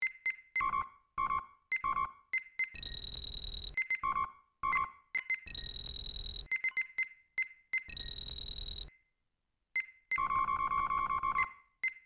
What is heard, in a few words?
ops beep sequence, computer